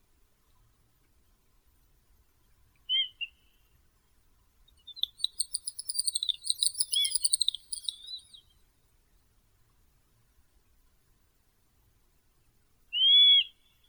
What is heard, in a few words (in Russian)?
dupel gallinago media